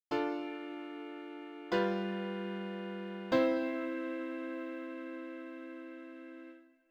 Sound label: Ii-V-I without subV; Tritone substitution